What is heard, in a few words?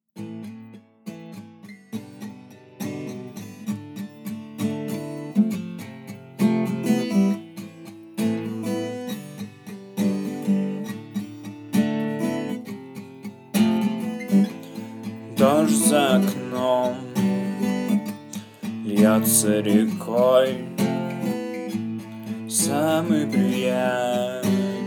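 Rain Guitar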